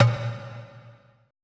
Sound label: E LOG #A, ETH DRUMS